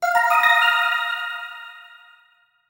Fanfare Golden Bug; Pick up a Golden Bug

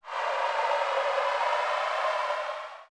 Sandscreen; zelda; OOT

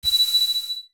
Sage Appear; zelda; OOT

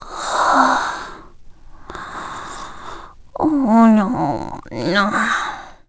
Link Awaken; Link waking up (during the intro)

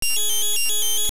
Baby; Link transformed into a baby (during fight with Stalfos Reaper [OOA Mini-Boss 8]); Zelda Oracle

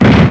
Knock Down

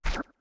Step; Deku Link walking (same sound in 3 pitches)

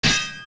sword clang; Sword clanging against an enemy shield (3 pitches, also used for Hookshot or Boomerang hitting a wall; also used for Hookshot or Boomerang hitting a wall, and Link's Hylian; OOT

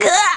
Die; Link dying (2 parts)

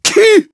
Scoff; Groose scoffing at Link; Skyward Sword

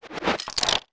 Mask On; Put on a transformation mask; taking it off also uses Item sound

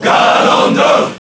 Crowd; Ganondorf

